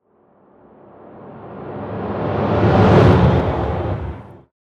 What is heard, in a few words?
swoosh thunder